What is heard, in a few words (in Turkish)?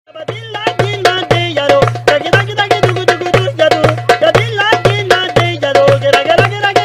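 kedi ile darbuka çalıyor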